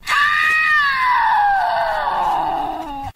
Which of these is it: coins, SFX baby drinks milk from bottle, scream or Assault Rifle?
scream